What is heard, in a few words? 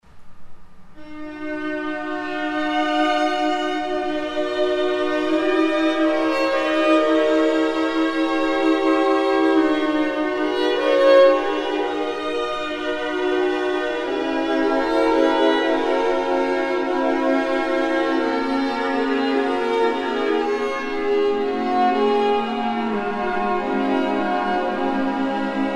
Claire de Lune, Classical, Strings; Celestial Strings